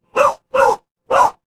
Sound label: animal dog bark